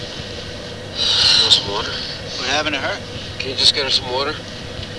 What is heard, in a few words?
water